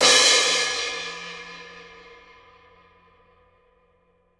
Crash, Cymbals

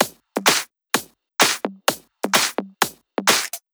Loop, 128 BPM

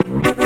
E stab; Loopmasters; Funky Underground Vol 2

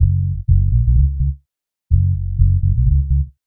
126 F# C# BASS, Funky; Loopmasters; Funky Underground Vol 2; BASS LOOPS

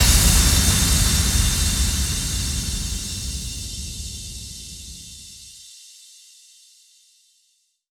FX Impact

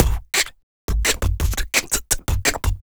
Human Beat Box, Drum & Bass; Loopmasters

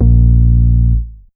Bass Shot A#; Mutekki Media; Vengeance Electroshock Vol.2; Bass Shots

